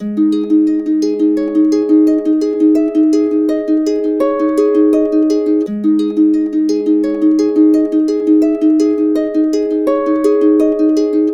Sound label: AB 84, Complete Harps; USB Soundscan vol.62; AKAI